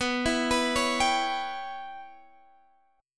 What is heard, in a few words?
Db Minor 7th Flat 5th B